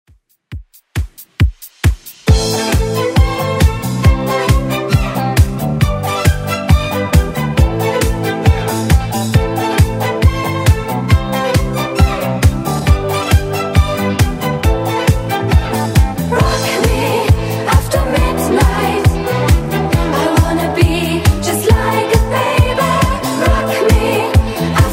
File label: 80s Redrum, 136 bpm